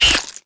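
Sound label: Vampire Bat Attack; Seer; GamigoNA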